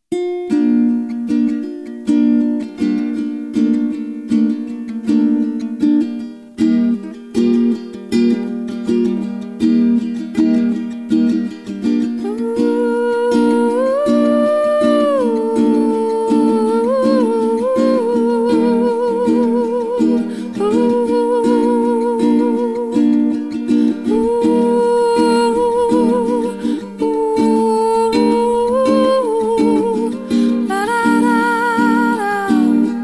Ukulele; Entertainer

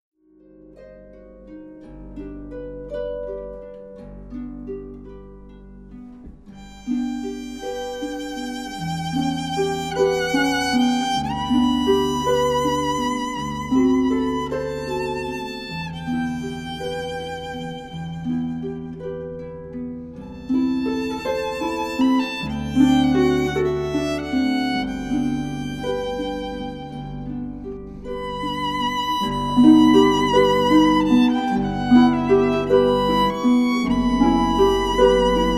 violin and harp; Ave Maria; Catherine Boyd

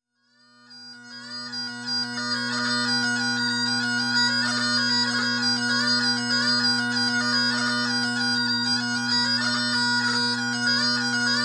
Scottish Hornpipe, bagpipes

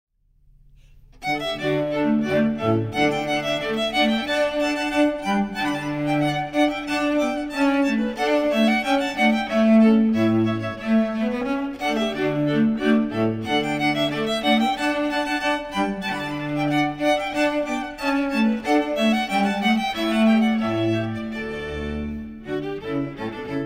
Rondeau, string quartet

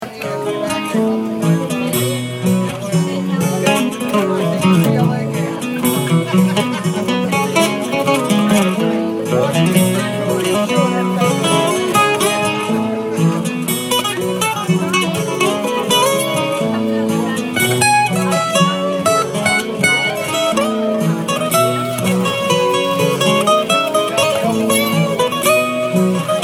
Instrumental; acoustic guitar

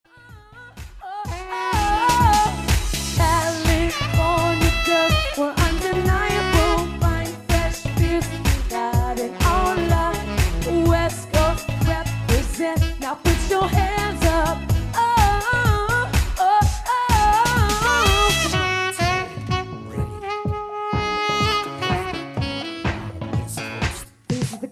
Pop duo audio, sax guitar duos

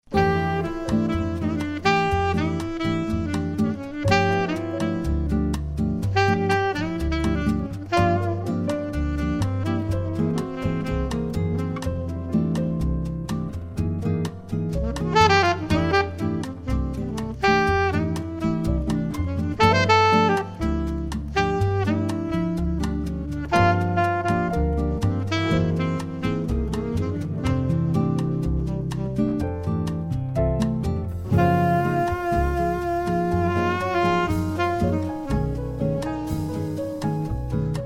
Jazz; David Turner Music Ensembles

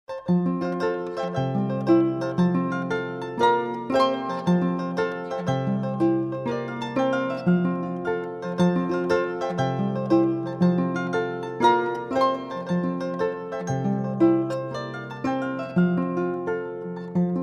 Recessional Celtic Dance, Harp

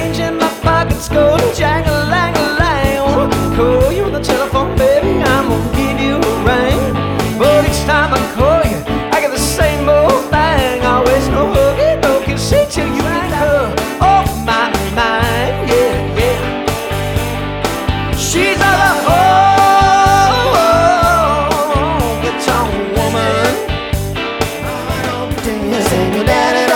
Rock Songs, cover band

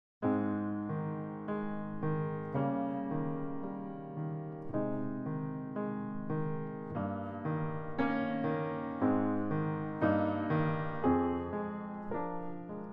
Piano; Adagio Cantabile

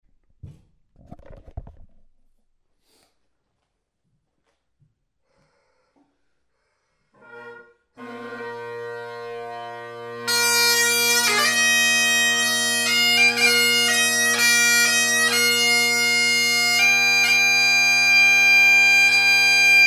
Bagpipes

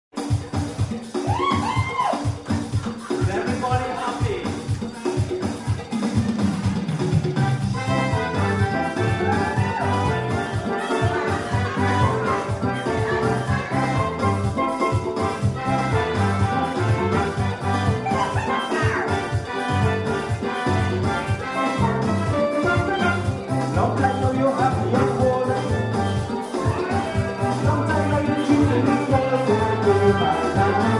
Island Happy, Steel Drum Band; Baltimore MD

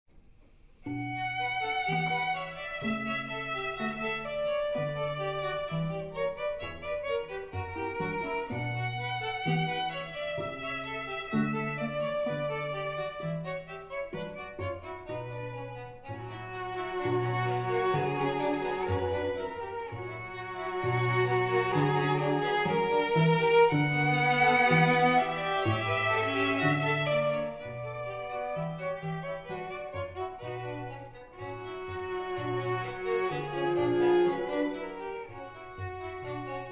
String Quartet in D Major Andante Espressivo; Mendelssohn

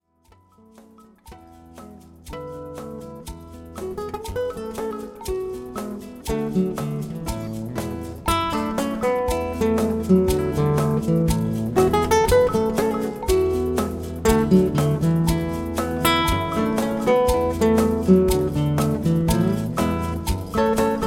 loop, guitar; David Oconnor

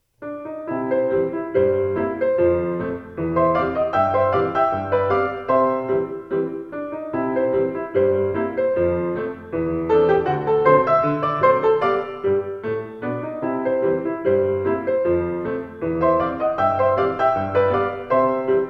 Entertainer, piano

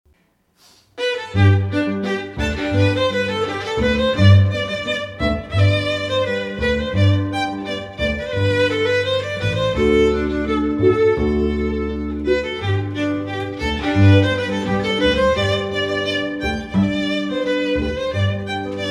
Rondo, string trio; International String Trio

